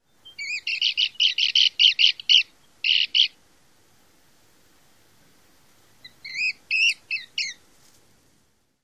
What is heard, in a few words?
more calls